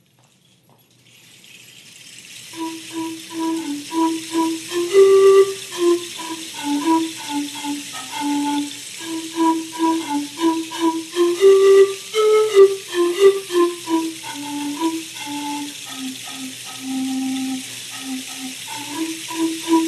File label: pan flute and rain stick